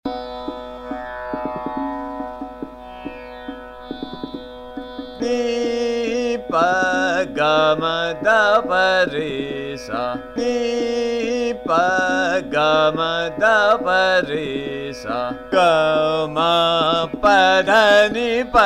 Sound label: Sargam, Ni Pa Ga Ma Dha Pa Re Sa, Ektaal Drut; Nand